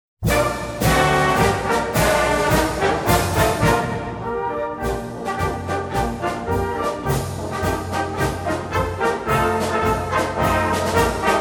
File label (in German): Marsch